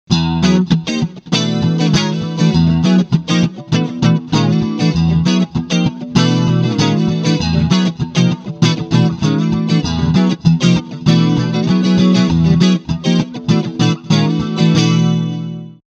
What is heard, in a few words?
Clean Single coil